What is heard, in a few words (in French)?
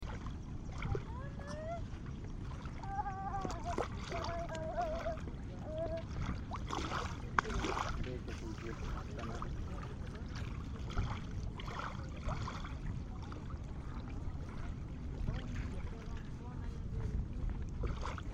clapotement lessive embouchure